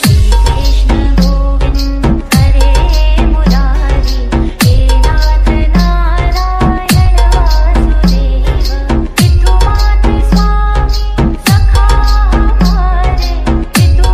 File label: romantic music ringtone, bollywood